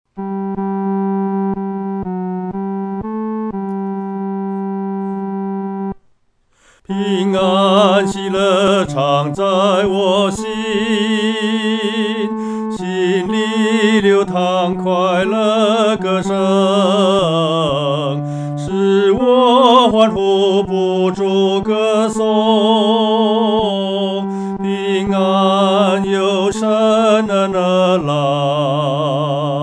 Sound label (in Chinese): 独唱 第三声; 奇妙平安由神来